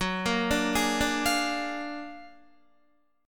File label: F sharp Augmented Major 9th F sharp Guitar; arpeggiated; JGuitar